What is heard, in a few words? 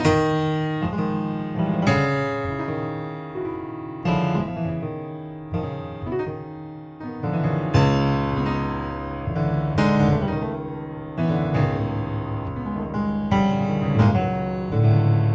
piano solo; Spire II